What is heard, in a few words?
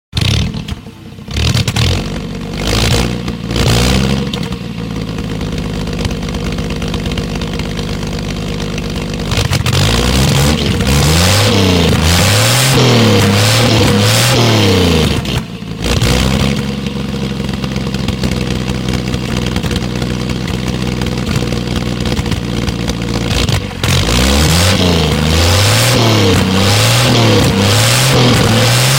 Chevy truck 53